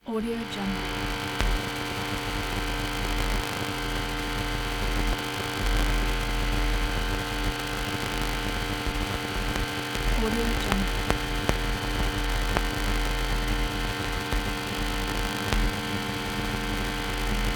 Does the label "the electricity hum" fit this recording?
Yes